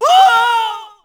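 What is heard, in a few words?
man voice fall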